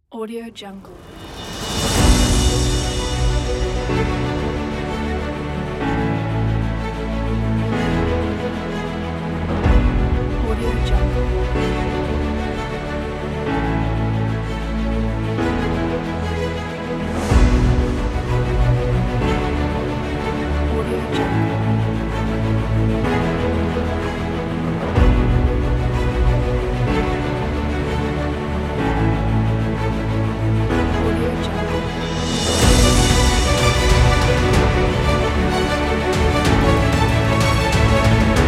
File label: cinematic orchestral pack; artsound